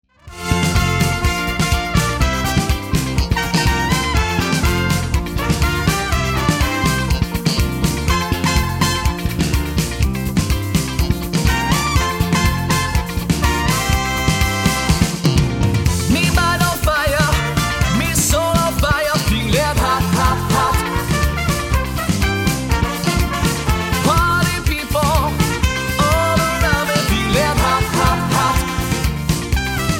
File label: Horns